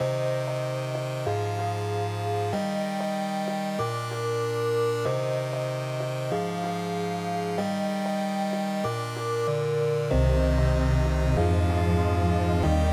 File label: Intro - 95 BPM D# Maj, Hip Hop Melody Loops